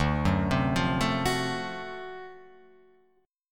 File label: D 7th Sharp 9th D down Guitar Open F; arpeggiated; JGuitar